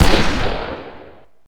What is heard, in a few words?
navy fire; weapons; tf2; fistful of frags